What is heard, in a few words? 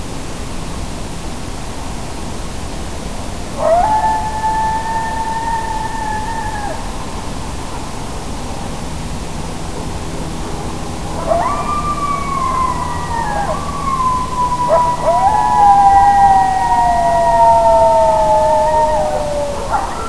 cayote; Cayote (version 1); zoology